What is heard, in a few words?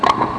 smash, racquet